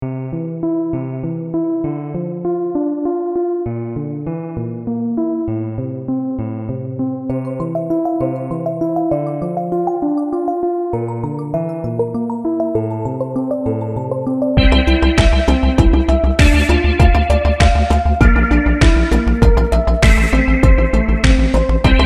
ROBOT FEELINGS

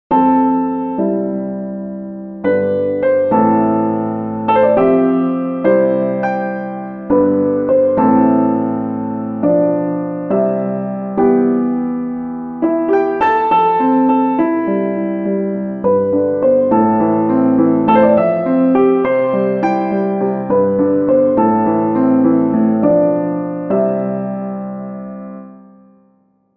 Piano Theme

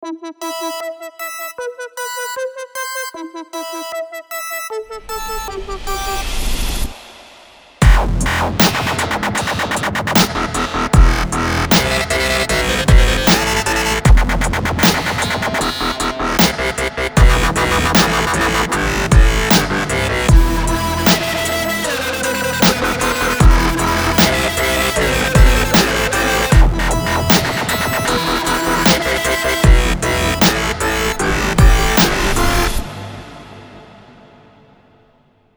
MONSTER ATTACK

